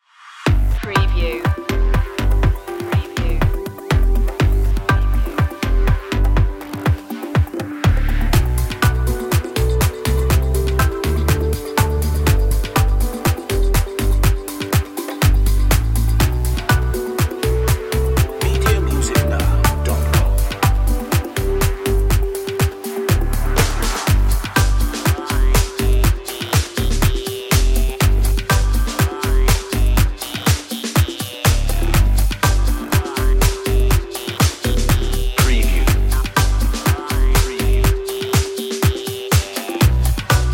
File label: dark electro house, A stylish and atmospheric electro track; electronica dark heavy